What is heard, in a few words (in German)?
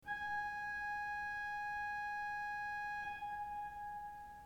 ton, orgel